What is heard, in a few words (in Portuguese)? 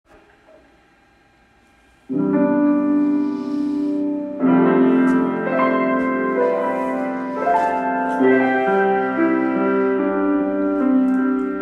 Contralto, coros; Bencao; encontro sinodal de coros do sinodo rio dos sinos